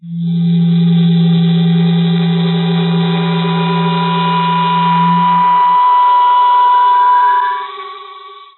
beam, technology federation

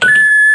keypress, computer; federation

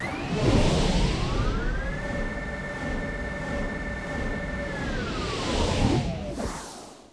turbolift; cardassian

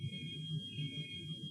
medical scan; federation

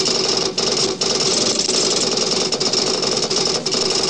comp access, computer; federation

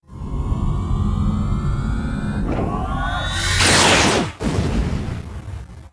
warp seq; federation

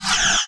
ship phaser; federation

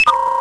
comm close, technology federation

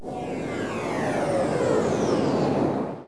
warp dropout; federation

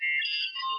input ok, computer; cardassian

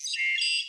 voice input, computer; cardassian

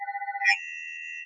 processing, computer; federation

